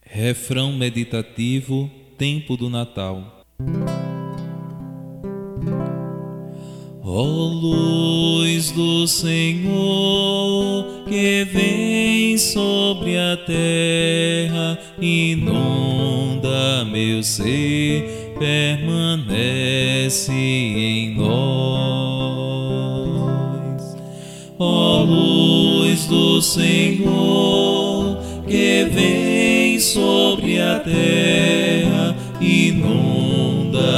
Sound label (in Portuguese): refrão meditativo natal